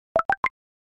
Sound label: prompt Tone